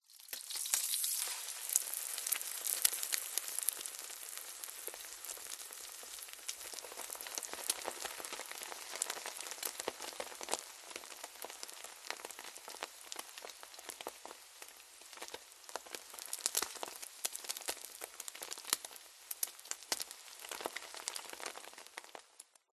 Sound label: food egg turn over fry frying pan sizzle; zapsplat